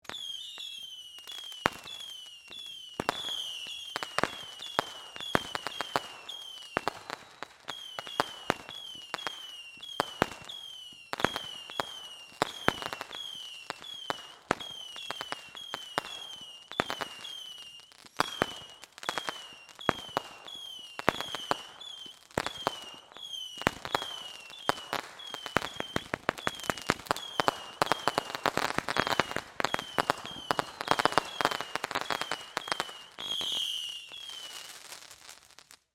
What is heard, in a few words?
Firework Whistles, Cracks, Pops; SoundEffects-BlastWave